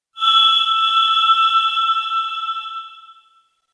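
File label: electronic grasswhistle blow clumsy; anemoi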